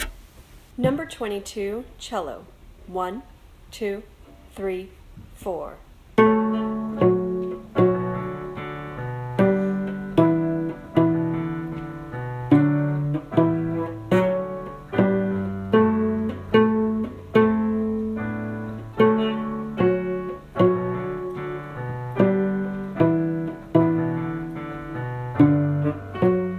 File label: cello